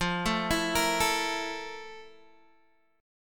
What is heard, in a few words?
F Major 11th Guitar DADGAD